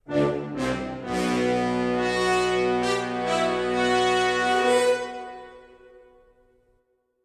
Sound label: Music Fanfare; Fable Anniversary